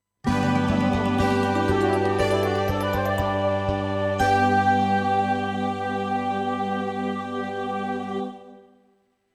SC880; Dune 2

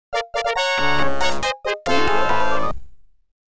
OPL2; Imperial Fighter Destroyed #1; X WING

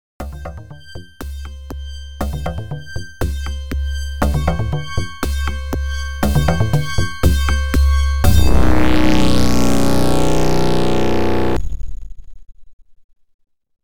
OPL3; DOOM2

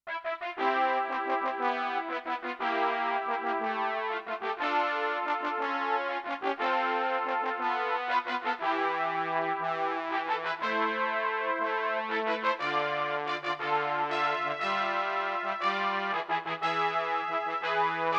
CM500 GM; silpheed

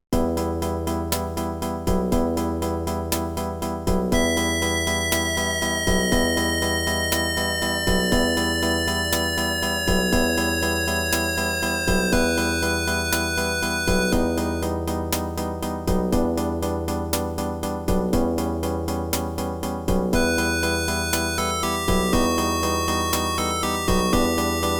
ADLIB OPL2

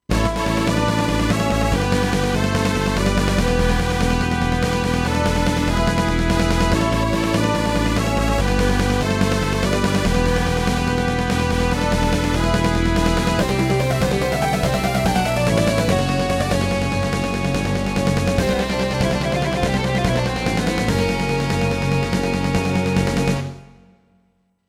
SC880; dune 2 the building of a dynasty

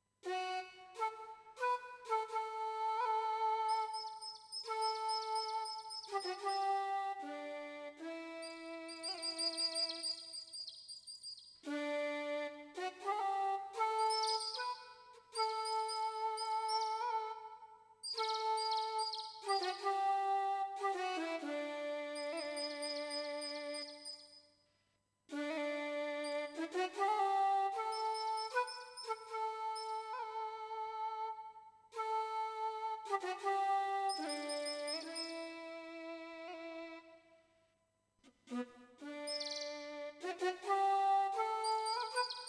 SC880; QFG3

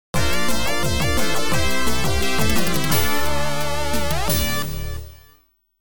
OPL2; Tyrian

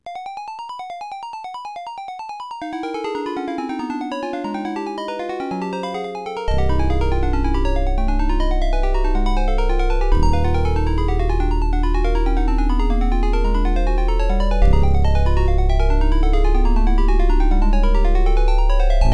OPL3

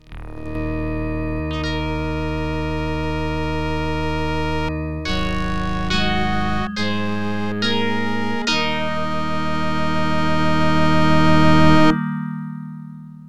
OPL3; Tetris

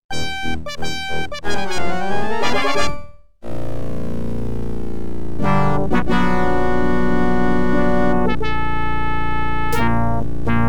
OPL2; Capture and Torture; X WING

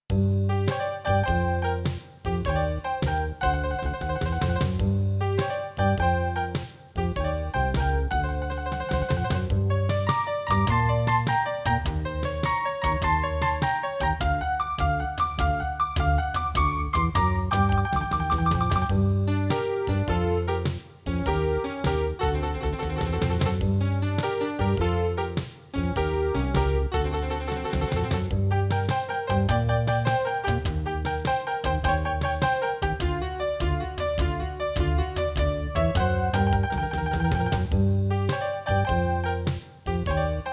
CM500 GS; LSL3

